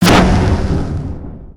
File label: Siege Tower Fire; destructibles